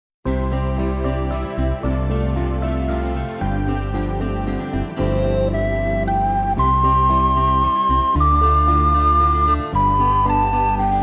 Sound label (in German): blockflötentaxi